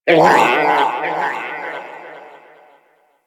Predator Vocal Captured Lift Away, Hunting Critters; Warframe